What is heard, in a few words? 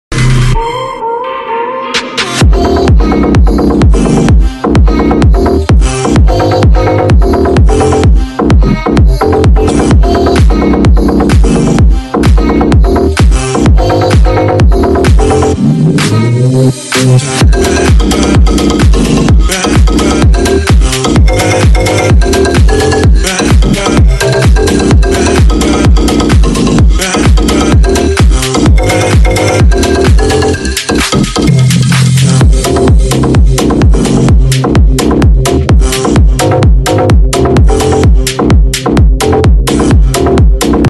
Mobile Ringtone